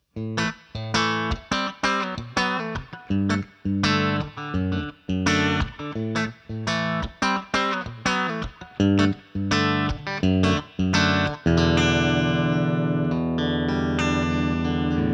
Fender Clean Bridge PU

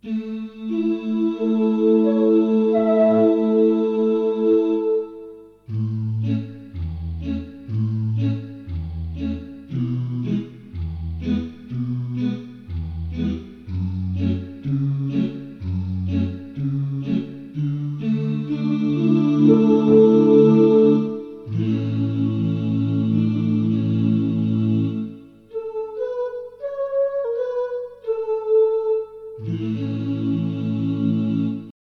Do Doh

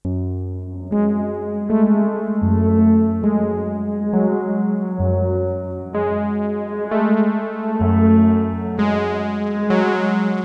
Synthesizer Reverse; Boss RV-200

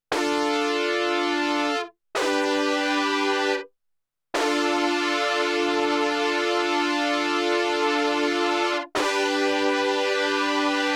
Tascam Model 2400 Chorus

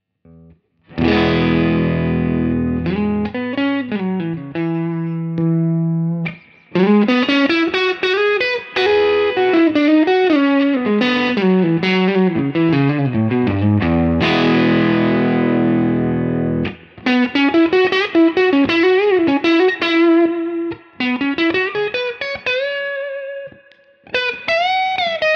Tone Tweaker Solo Boost; Carl Martin

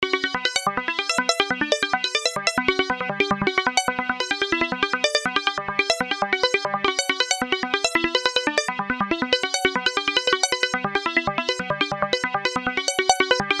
Reverb Echo Plug Sound

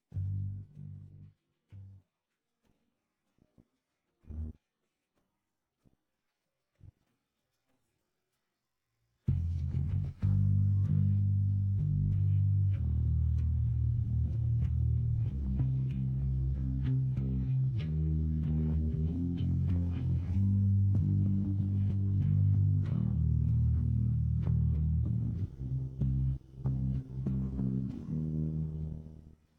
bass G minor 95bpm 440hz

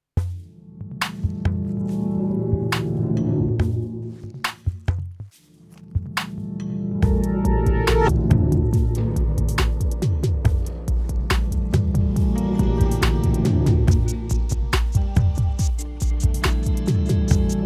Loops Synth; Workshop Korg Kaoss Replay

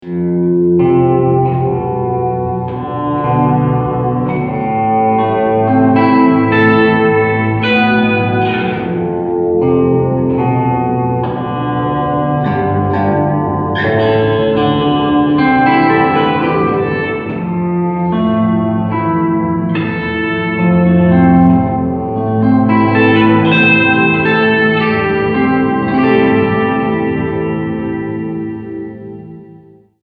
Texture Amp

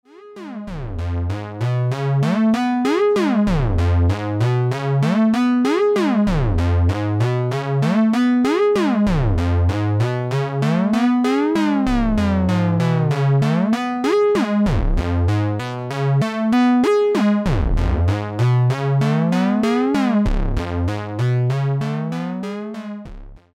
SSG Glide; Serge Crocodile

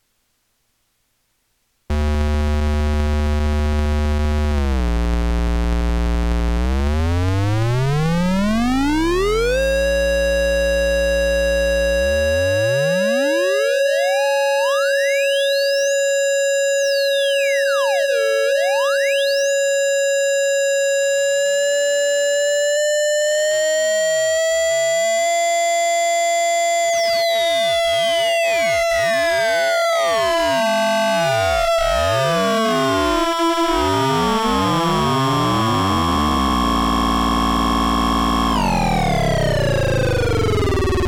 Korg Monotron Duo VCO 1 2 Modus

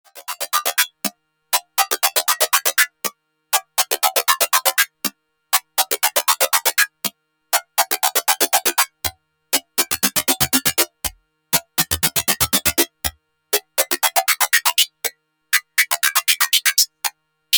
Filter Control; Radikal Technologies EFFEXX RT1701

